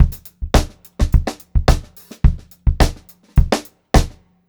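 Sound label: 106 groovy variation 2, funk groove drumloops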